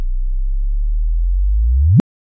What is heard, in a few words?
2 chirp